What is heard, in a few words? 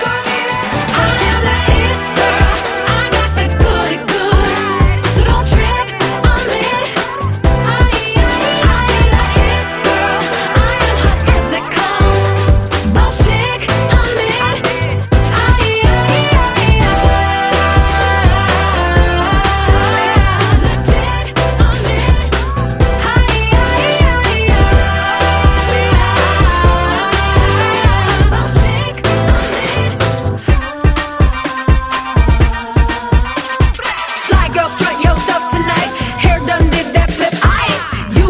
Pop Soul